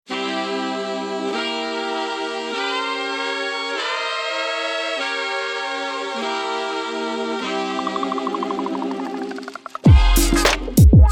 Jazz Saxophonist; Miami Jazz Saxophonist

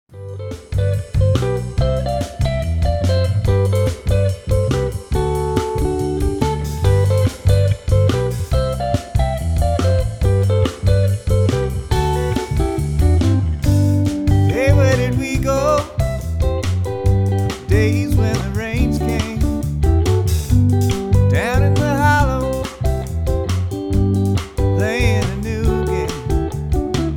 Variety Band; Chicago

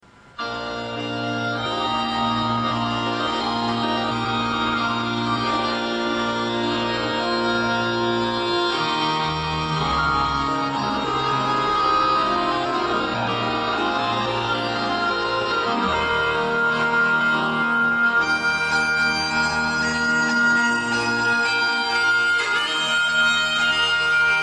Bagpiper; Baltimore; bagpipes

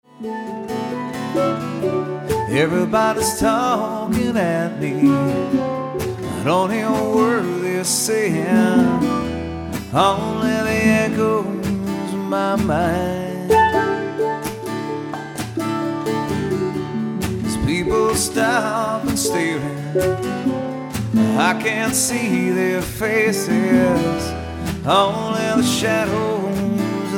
Steel Drummer; Tampa Steel Drummer; Steel Drum duo

